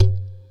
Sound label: Djembe cheap mic session